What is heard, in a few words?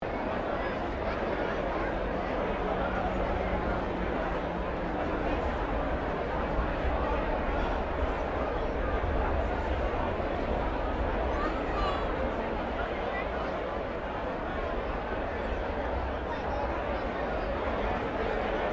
Ambience Noosa Resteraunt Cup Day Crowd Loop Stereo, Melbourne Cup celebrations in Noosa Queensland; Restaurant Sound Effects